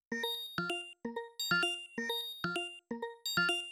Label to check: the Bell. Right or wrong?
Right